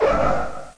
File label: Boeing 767-200ER land; X-System; Heavy Metal